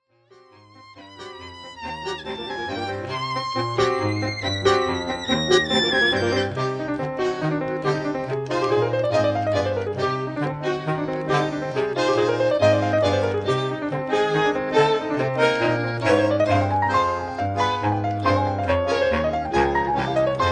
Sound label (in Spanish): TANGO